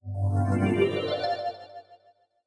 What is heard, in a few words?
twist